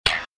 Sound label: button